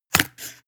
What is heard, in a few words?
scissors cut paperboard